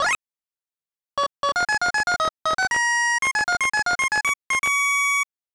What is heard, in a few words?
Docking Success; Moon Cresta